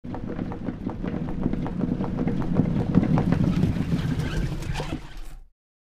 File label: Car Tire Flat Medium